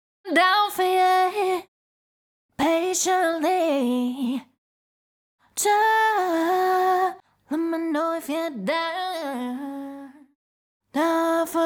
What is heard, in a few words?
CLEAN ADLIBS (F#m), Pop Vocals, Acapellas; Ghosthack; Ultimate Pop Vocals